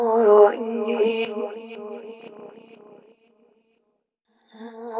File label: Sahara Static, Vocal Samples, Sound Design; Sonic Foundry (Sony Creative Software); Pocket Diva World Class Vocal Samples